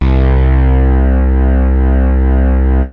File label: bad synth bass; Basses